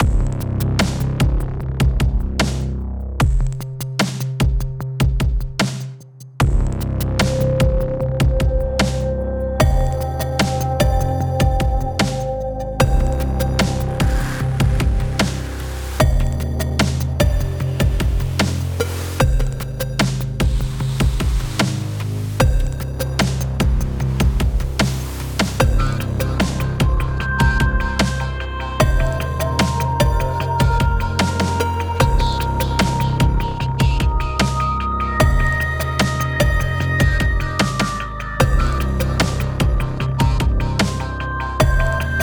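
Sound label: Music Industrial, Industrial Loading 75bpm; Forza Motorsport 6 Apex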